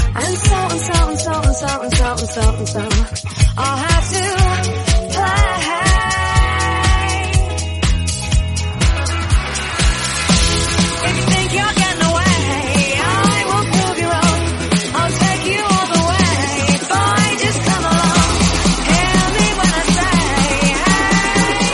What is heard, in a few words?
122 House Mix 122bpm Fm